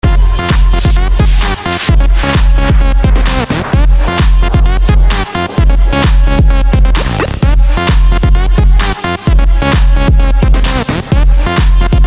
Ringtone Bass

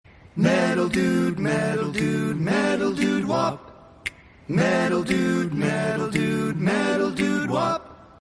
Metal Dude Wop

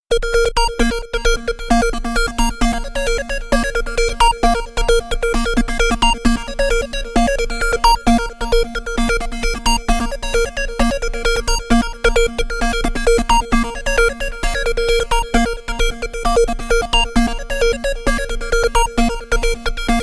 Trance loud

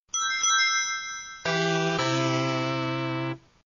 boot; sega cd

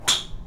Golf Tee Shot

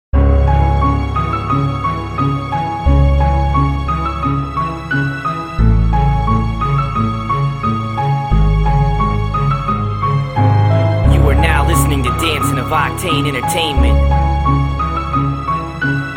black beat, hip hop

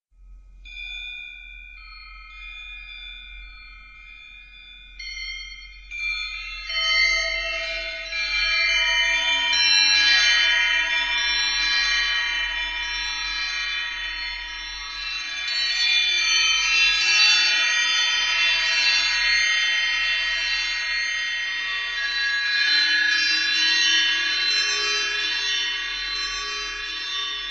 Wind bells

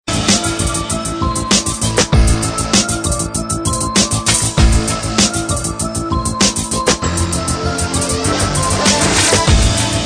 hip hop beat

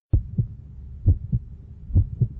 Heart Beat Slowed

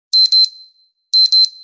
3d beep sms; ringtones

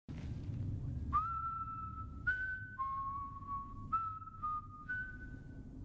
Whistle; TWD; Saviors

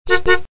car horn

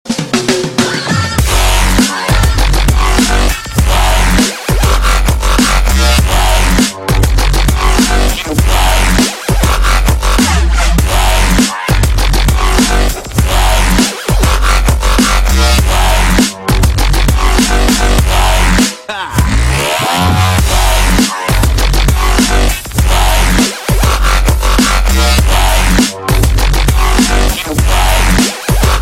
dubstep twist

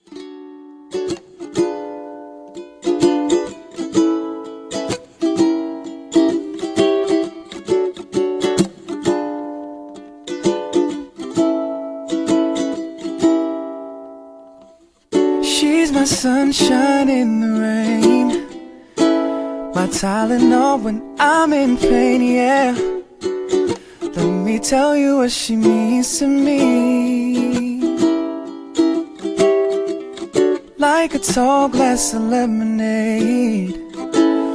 ukulele version

